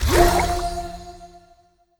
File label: Herbalist Spell